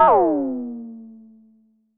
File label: Drum X FX, Pearl